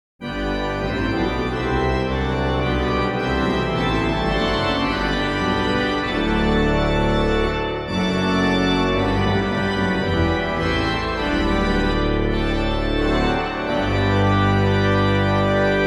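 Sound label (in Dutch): begeleiding uitbreiding, orgel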